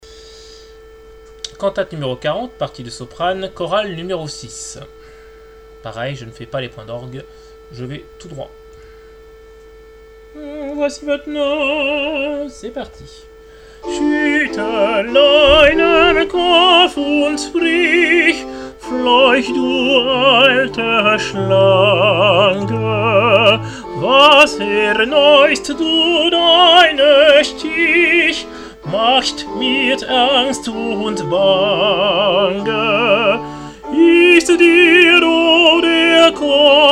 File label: coro sop; bwv 40